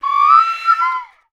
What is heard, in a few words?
ceramic, flute pipes; Voice Crystal - Steve Reid Definitive Percussion